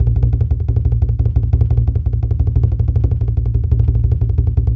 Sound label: Bass Roll, African Drums, Bass Drum Rolls; Quazibeat; African Secret